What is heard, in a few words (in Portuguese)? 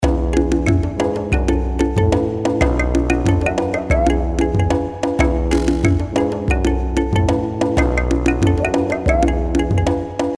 Samba Lele